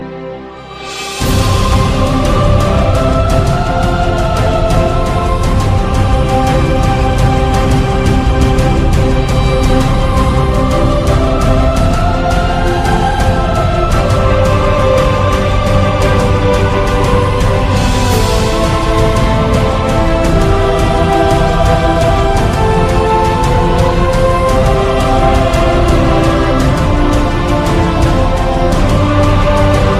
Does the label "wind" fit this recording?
No